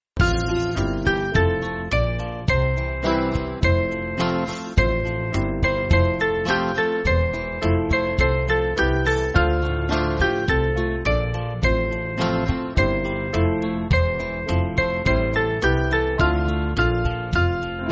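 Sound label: Band, church backing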